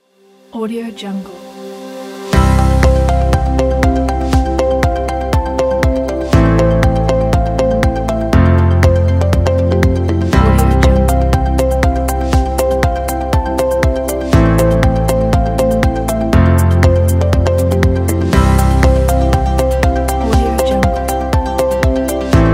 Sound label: infomercial